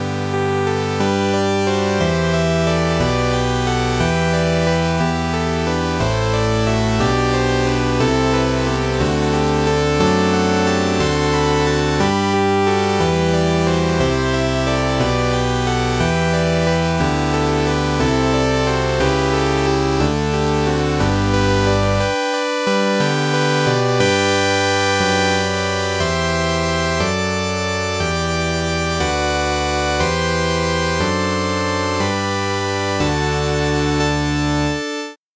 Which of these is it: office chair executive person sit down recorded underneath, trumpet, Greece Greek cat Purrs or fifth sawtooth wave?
fifth sawtooth wave